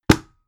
bucket put down hard

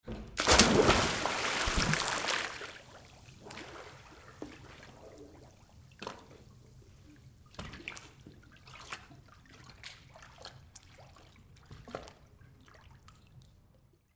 pool dive

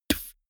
beatbox bass drum dull

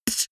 beatbox snare damped

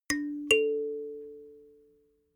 notification kalimba fifth up

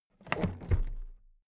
toolbox put down heavy

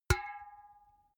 empty can hit hard

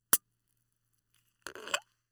beer can opening

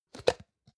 plastic can small lid open medium

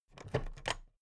plastic clasp open heavy

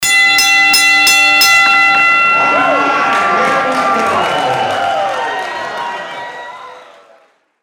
boxing pre fight atmo

